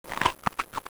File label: eat; DesertTrek